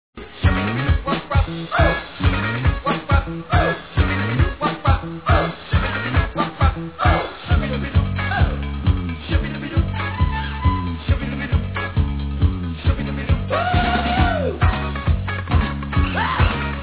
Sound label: bebop